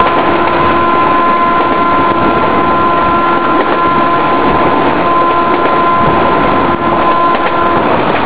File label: ydm4 whistle